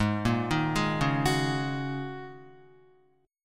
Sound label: G sharp 7th Suspended 2nd Suspended 4th, Guitar; G#7sus2sus4 arpeggiated; 3,1,3,1,1,2; JGuitar